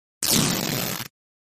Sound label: Fabric Tear Rip